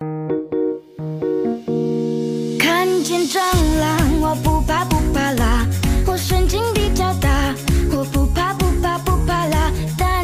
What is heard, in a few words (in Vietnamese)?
Nhac nen remix TikTok